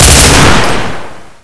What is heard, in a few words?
m16 burst